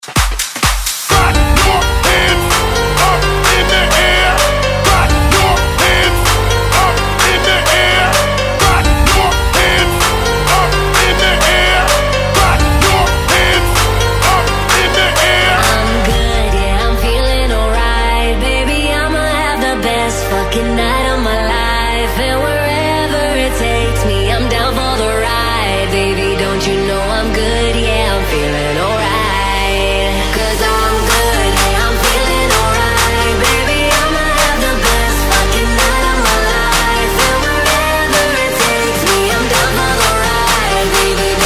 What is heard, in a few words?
Clap Hype Intro